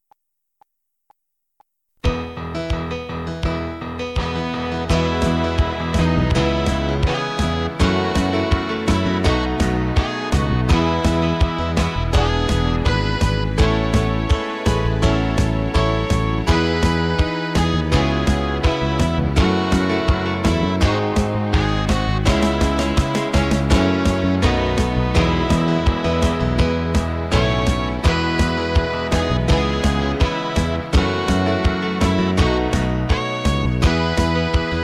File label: Christmas Auld Lang Syne; backing track